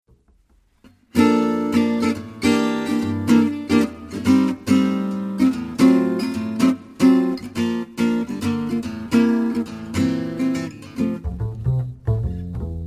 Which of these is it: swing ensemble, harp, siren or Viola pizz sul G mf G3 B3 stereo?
swing ensemble